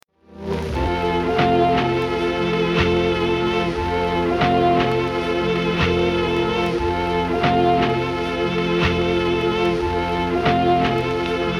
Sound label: optigan